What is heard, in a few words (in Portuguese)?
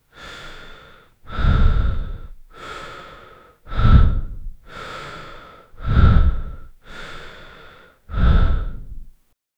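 respiração pesada e poderosa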